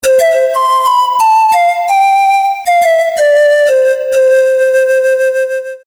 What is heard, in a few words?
Jingle Pan Flute